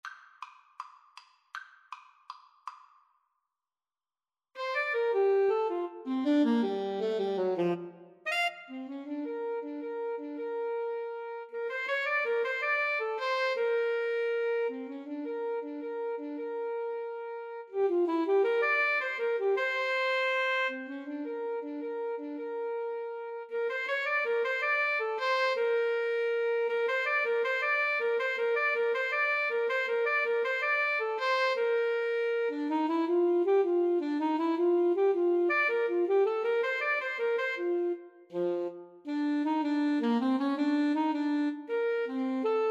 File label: the entertainer, Clarinet-Saxophone Duet